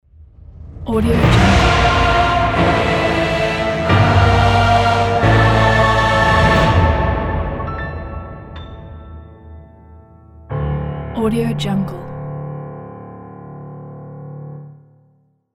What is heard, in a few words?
heroic epic logo intro opener; pablikmm